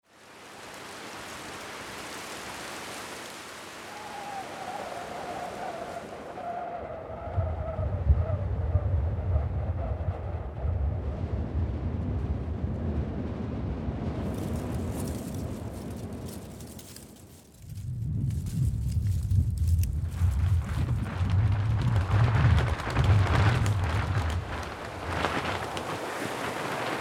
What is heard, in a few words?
Winds Of Patagonia; Winds Of Patagonia and other remote south american ambiences